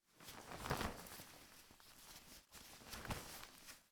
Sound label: clothes shuffle; Papers Please